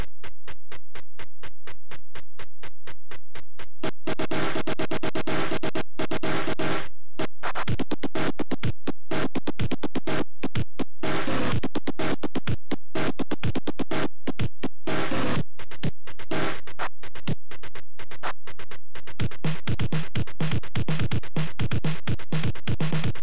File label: Drums; To be on Top; AST; Drums to Heaven